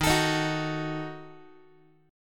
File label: Eb Diminished, Guitar; Ebdim strummed; x,11,12,13,10,x; JGuitar